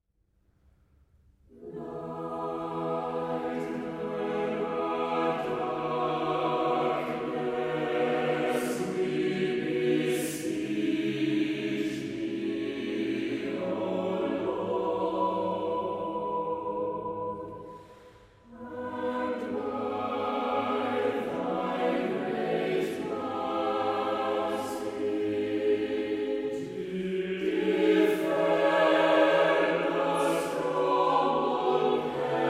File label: Prayers For Peace Satb